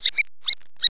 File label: MOUSE; Kids' CD-ROM Bible Fun Pack; Education Publishing Concepts; NOAHDEMO